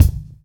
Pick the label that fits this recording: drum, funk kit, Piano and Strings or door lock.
drum